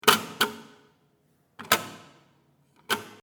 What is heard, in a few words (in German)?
WC Kaugummiautomat